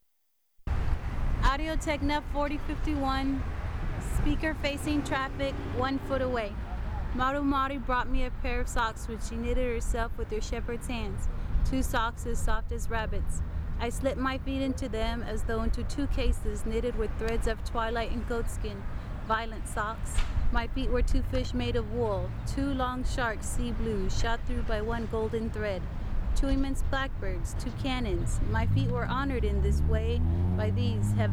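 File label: AT4051 1ft Speaker Facing Traffic, Exterior; AT 4051a Cardiod; AT4051 1ft SFT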